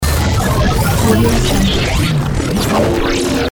mechanical glitch transformation mech; bbrealsound

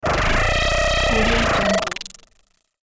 ray gun blast

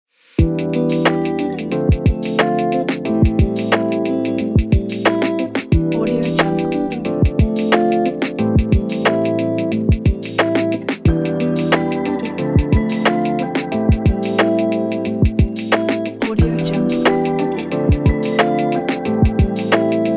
chill beat guitar lounge hiphop

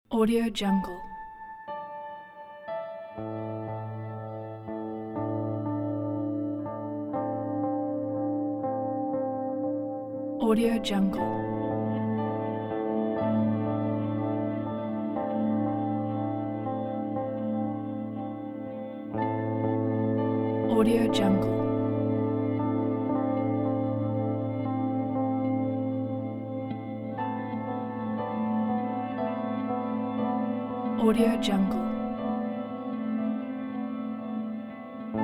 eerie mystery background

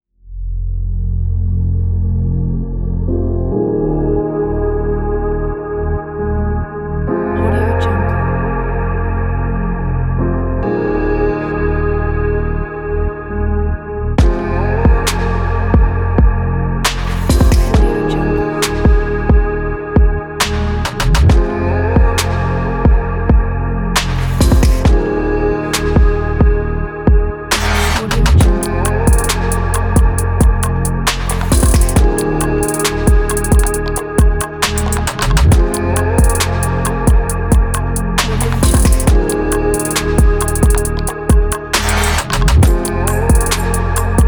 rap beat

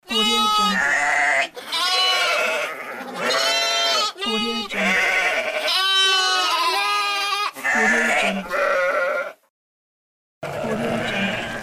lamb and sheep

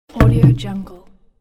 falling big wood log

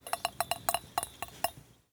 water drip in empty pan small double drips; prosoundfx

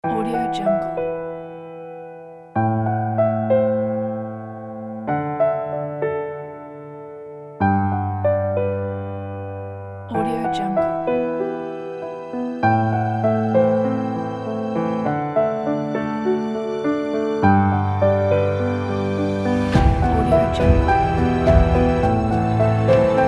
sad dramatic cinematic piano and orchestra

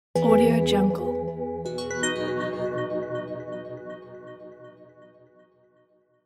simple logo intro bells; macro-media

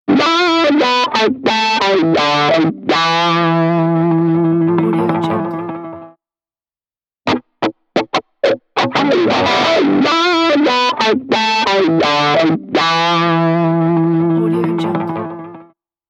wah wah guitar; alexandersizonenko

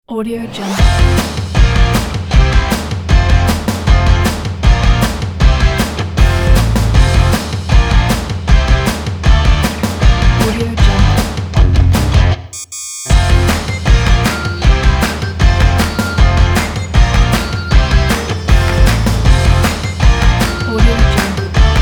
happy kids indie rock

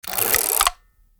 mechanism windup; soundmorph

